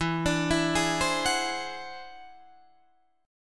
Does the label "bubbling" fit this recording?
No